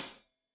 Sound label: Hat Tight, Hi-Hat, Cymbals; Deeply Sampled Drums